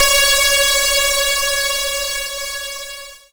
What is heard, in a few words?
C#, Synclav FM PLUCKED; Big Fish Audio